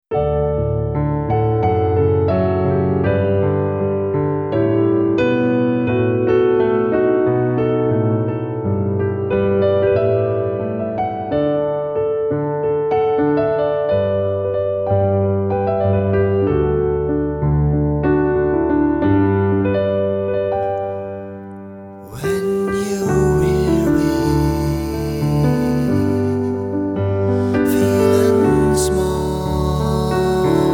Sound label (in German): Gesang; Bridge